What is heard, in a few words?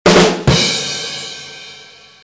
Ba-dum-tss